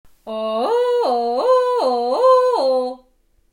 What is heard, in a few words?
Yodel